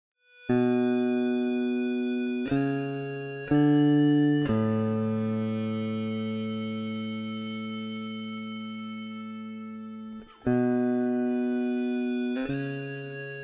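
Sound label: melody ebow, eBow Melody, bass; This snippet of the 2nd part of the melody consists of single notes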